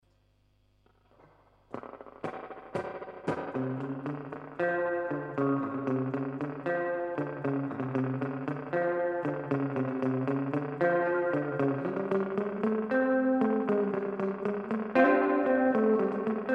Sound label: Silverlake Spring